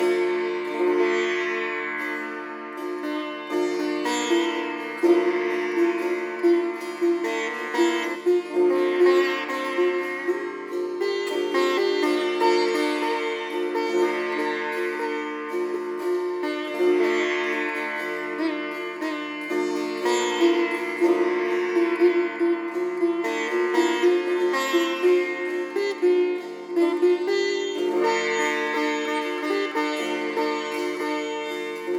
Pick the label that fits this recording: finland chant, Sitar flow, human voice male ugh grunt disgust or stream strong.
Sitar flow